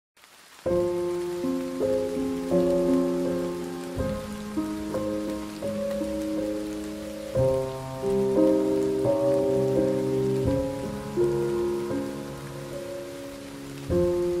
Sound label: Slowed rain 8D